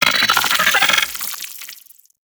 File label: Arachnoid Wraith Vocal Patrol; Warframe; Corpus